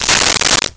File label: Coins Poured Out; 1001 Sound Effects